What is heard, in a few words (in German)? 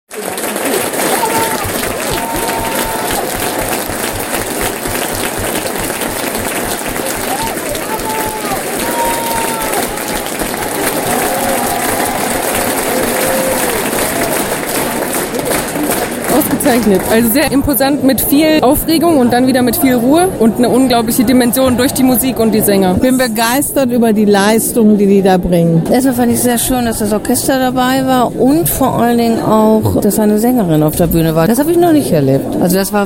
Theater Publikum Stimmen; Theater Bielefeld